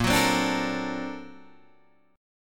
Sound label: Bb Augmented Major 9th Bb down Guitar Open D